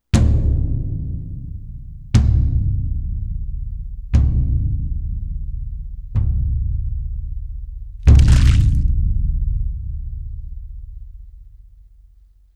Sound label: CUES CRUNCH; Into The Woods